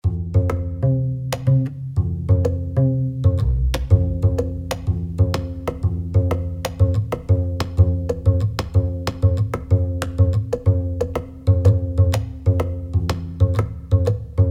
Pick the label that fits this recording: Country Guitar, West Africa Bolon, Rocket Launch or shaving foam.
West Africa Bolon